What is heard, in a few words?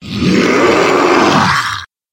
monster dark harbinger